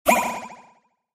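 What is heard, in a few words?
Electric Bounce Reverb, multimedia element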